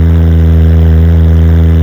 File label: Car Drone Loop